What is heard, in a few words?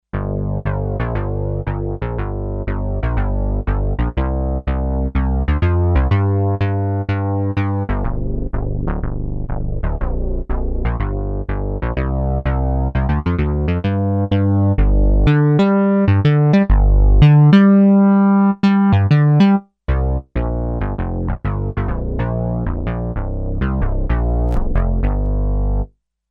Synthbass detuned unison, Sequential Circuits Six-Trak; Factory Programs